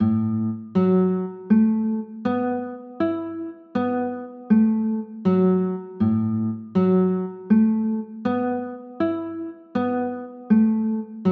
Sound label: a 6 9, chords